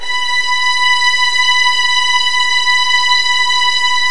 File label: B3, Virtuoso Strings